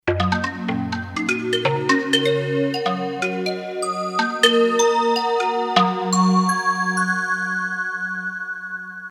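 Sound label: ethnic bell, roland jd800; card demo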